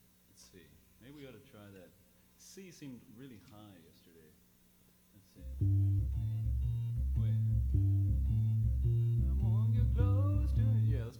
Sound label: Arranging